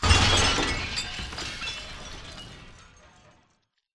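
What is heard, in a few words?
explosion mid distance debris; corporate; Titanfall 2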